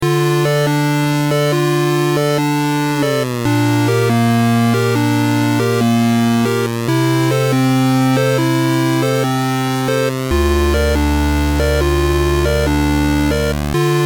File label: beep noises; whoa